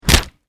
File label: Impact Punch Face Hard; Punches and Hits; Combat